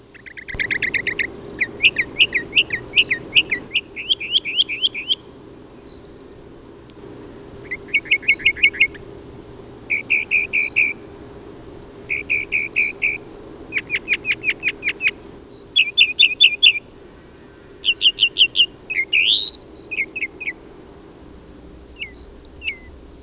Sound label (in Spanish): ruisenor; Los Colores que Cantan